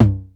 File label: 909-Style drum hits; FM 57 - The Ultimate Sample Collection (1997)